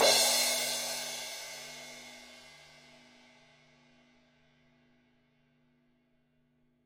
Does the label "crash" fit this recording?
Yes